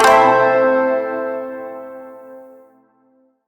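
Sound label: Shawzin Major Chord I; Chords; Warframe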